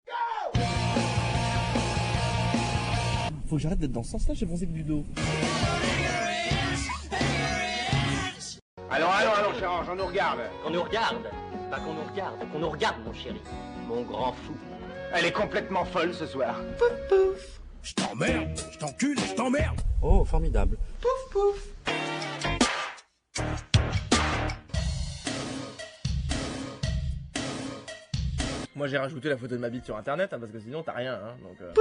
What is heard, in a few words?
bumpers